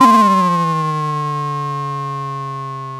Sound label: Sound of a doorbell